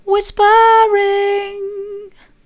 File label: whispering soft